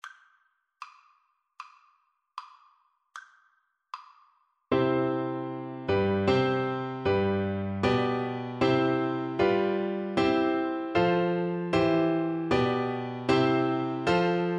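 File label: german national anthem, Flute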